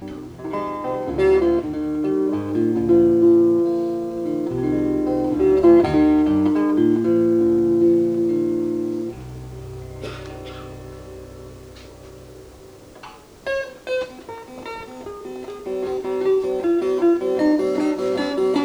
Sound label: tuning, live; Live in The Palms Playhouse